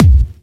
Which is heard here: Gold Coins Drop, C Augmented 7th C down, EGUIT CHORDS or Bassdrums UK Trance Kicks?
Bassdrums UK Trance Kicks